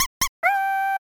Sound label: squeak squeak awoo